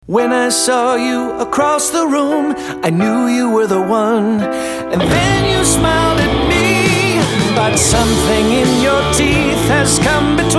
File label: Vocal, Music